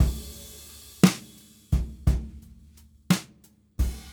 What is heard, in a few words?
Brushes SN HH